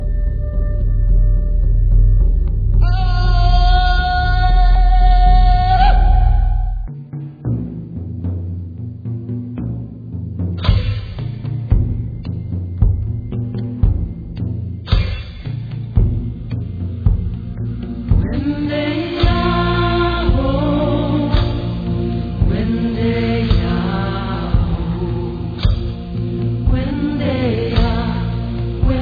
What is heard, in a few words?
Native American Cherokee morning song; Country